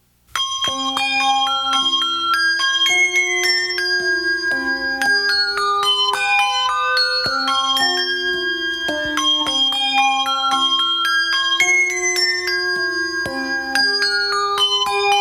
music box

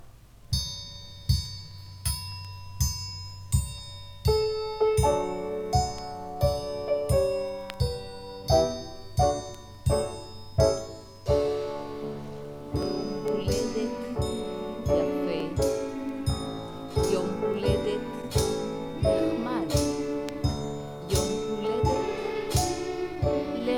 childrens happy birthday; israel

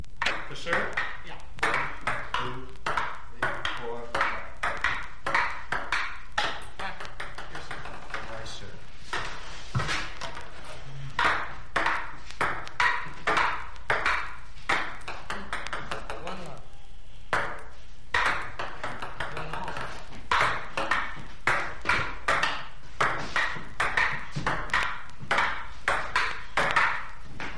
ping pong game

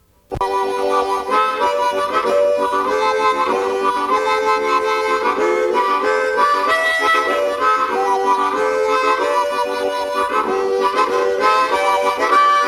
harmonicas count your blessings

